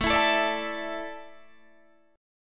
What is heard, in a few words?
A Suspended 4th, Guitar Half Step Down